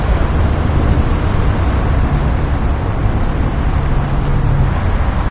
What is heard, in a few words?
outdoors well, ambient; Source Engine; tf